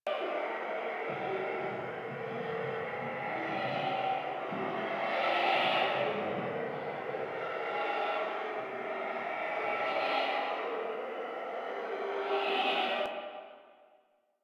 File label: Interior Critter Loop; Warframe; Infested Eris; Ambience